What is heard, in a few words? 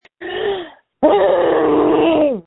Scream